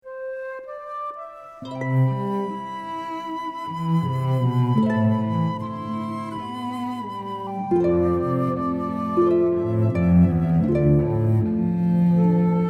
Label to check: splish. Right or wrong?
Wrong